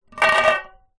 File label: tin small empty no lid down on hard ground; foley; zapsplat